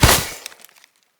Great Sword Melee Impact Vegetation; Weapon Impacts; Player; Swords; Environment; Founders Great Sword

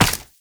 Run Ice, Footsteps; Warframe; Tenno

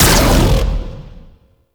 Alt Fire Shot; weapons; Warframe; Corpus; Tenet Quanta

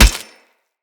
Hammer Impact Flesh; Weapon Impacts; Player; Combat; Tuvalkane Hammer

